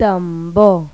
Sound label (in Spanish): TAMBOR; Pipo-Aprende musica con Pipo